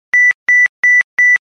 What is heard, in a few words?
Low Beeps; LG enV2